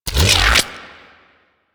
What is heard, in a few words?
Shotgun Beam Retract; Weapons; Revenant; Warframe; Tenno